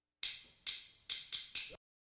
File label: Additional Instruments; Multitrack